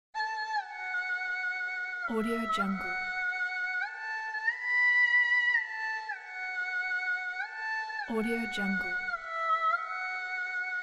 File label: chinese erhu melody